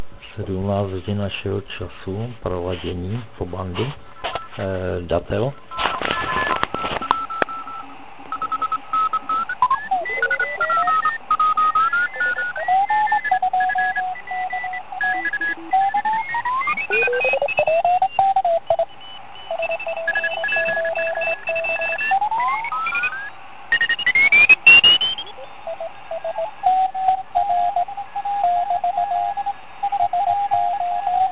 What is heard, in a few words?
Datel Tuning